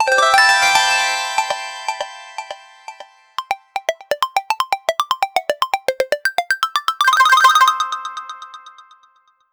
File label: arcade game coin collecti